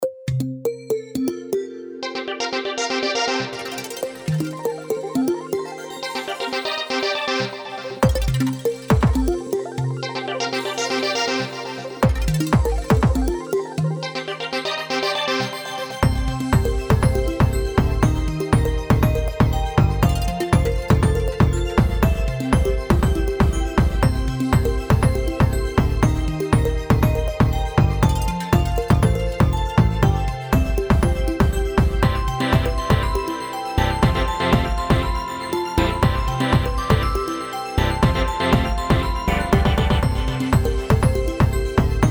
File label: rainbow beat